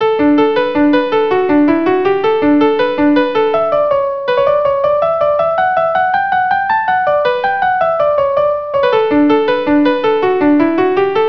jig

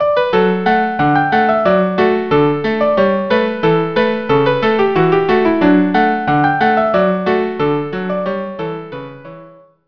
violin cello

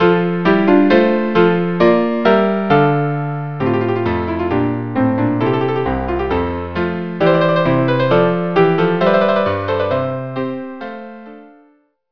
ballo ariodante trio for 4

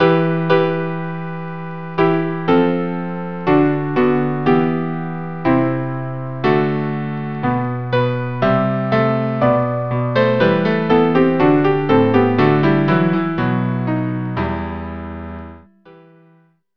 pavane trio

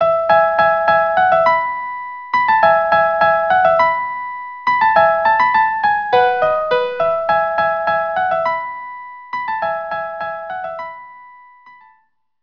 solo

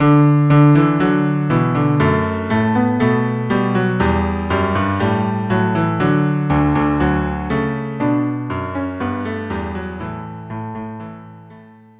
cello duo; Rinaldo

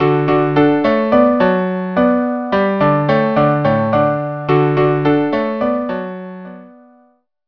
bouree

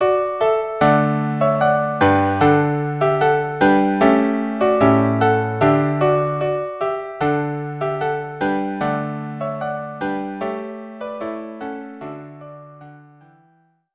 string quartet; La Bergera a lumbreta del bussun

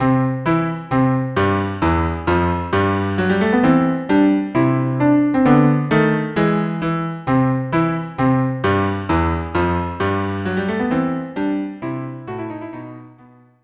CELLO DUO

